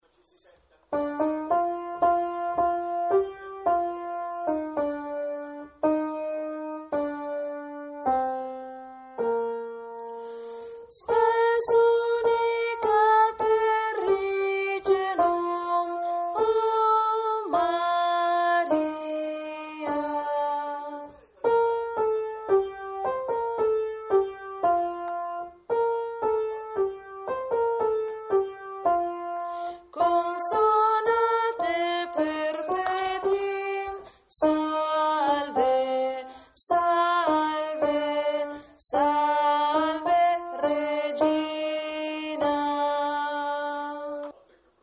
salve regina uomini

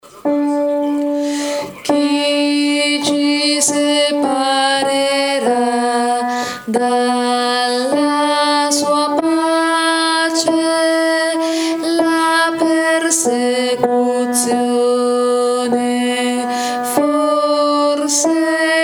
separera contralti

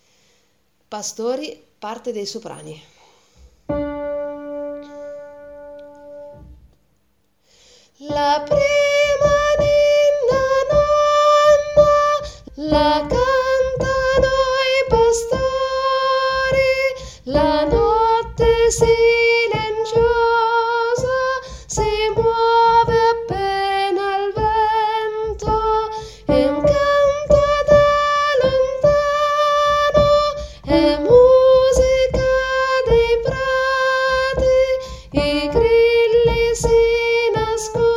pastori soprani

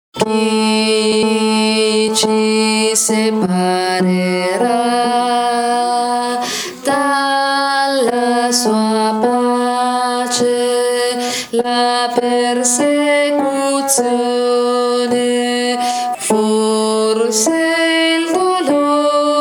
tenori

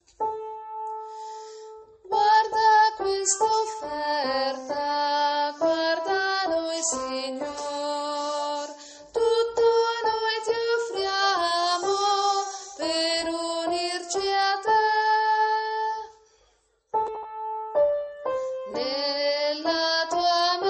contralti

